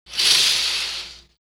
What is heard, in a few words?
curtain